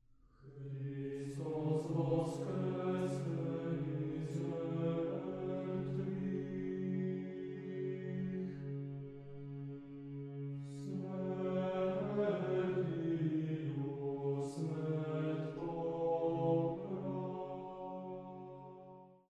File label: Boys' Choir; Praise The Lord In Heavens; Hristos Voskrese